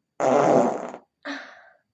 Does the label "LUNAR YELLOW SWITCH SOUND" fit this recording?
No